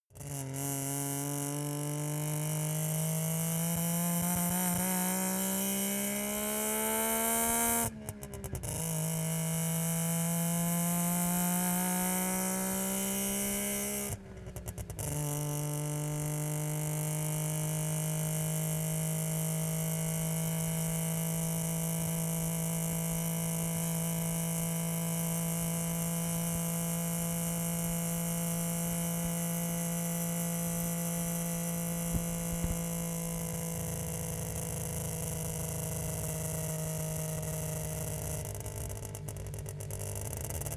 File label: car saab 92 drive exhaust